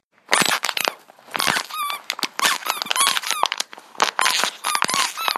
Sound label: loofa floppy water bottle dog